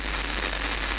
static medium; cstrike; D26 slender

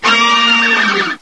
fail

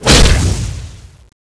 razor knife hit

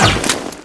volt bolt

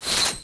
bug throw

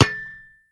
post hit

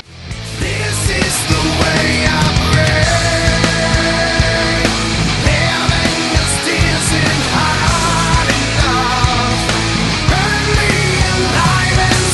metal; roundsound

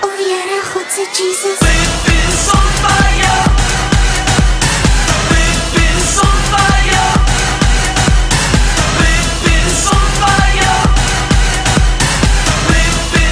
electro; roundsound

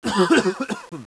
cough

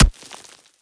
knife hit wall

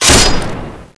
ghost hit

